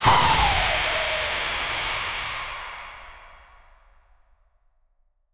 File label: ghost attack slash sound